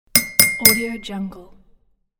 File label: tap glass with spoon; medusamusiclab